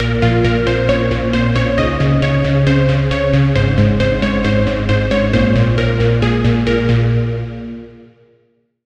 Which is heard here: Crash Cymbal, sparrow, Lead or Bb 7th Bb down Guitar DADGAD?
Lead